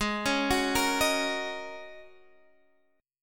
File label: G sharp 7th Suspended 2nd Suspended 4th G sharp Guitar Open G